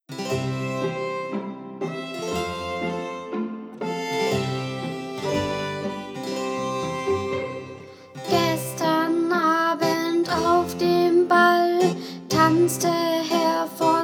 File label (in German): Floetentoene; Gemüseball; Meine ersten Floetentoene 2